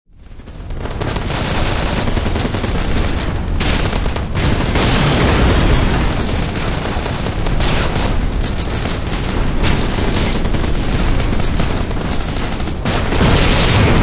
Warfare Modern War Battlefield City Fight Heavy Fighting; Battlefield Sound Effects